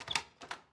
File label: Door Open; MiSide